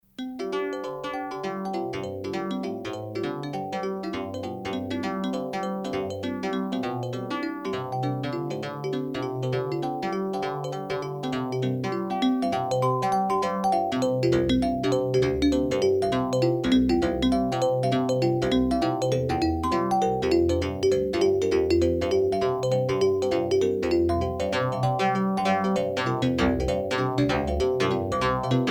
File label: Algorithmic Music Marimba E bass